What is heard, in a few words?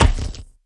Meat Rock Break; A Robot Named Fight